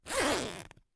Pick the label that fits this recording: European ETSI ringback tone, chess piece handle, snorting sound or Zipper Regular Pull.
Zipper Regular Pull